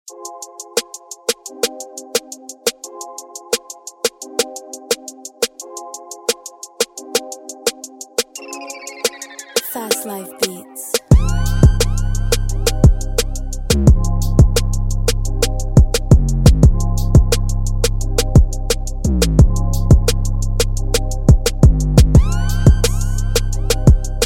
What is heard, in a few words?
Instrumental